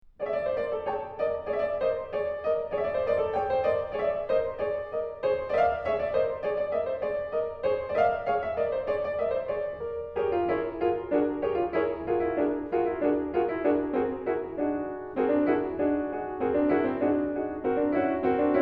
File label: Solo Piano, Burlesque